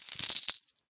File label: Coins Pour; ModeAudio Found Percussion Hits